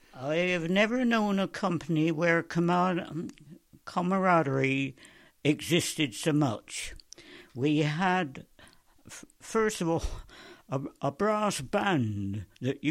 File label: brass band